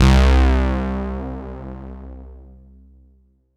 Roland JV 2080, interval; MG INTERVAL

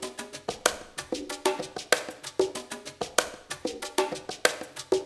ABO PERC L; Spectrasonics

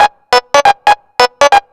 138 G#, pure-synthl, Techno; instrument-loops

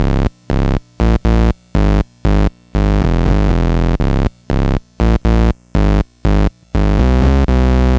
Atari 2600 Bass, chiptune

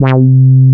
Bass Note C; Best Service; House Definition VOL-1; Basses

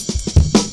heavy ridez cut, drum loops; Zero-G Jungle Warfare 1, 2 & 3

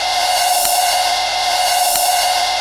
splash, drums; CMP - Analogon

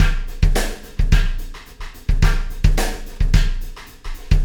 Wormhole Beat, Processed Drumkits

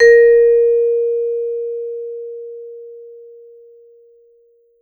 A#3, Vibes; Sampleheads - Dave Samuels Marimba & Vibes

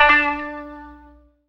koto (D); Sonic Foundry (Sony Creative Software); Ugly Remnants VOL-2

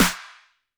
TD-20 Tom A, Roland TD-20 Toms

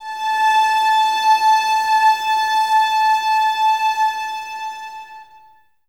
Cellos No Vib A 5, String Ensembles; Miroslav Vitous

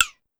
Conga Slap Down More, Pitch Env; Clavia DDrum-AT; Pitch Env Various

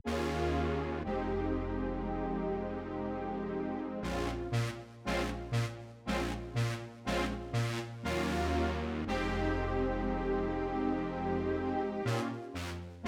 pad A, Smooth RnB, Abmaj

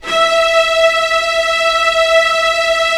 STR, STRING; Roland - LA Composer vol. 1

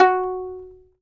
fiddle pizz F#4 L; Sampleheads; Whole Lotta Country 1 & 2

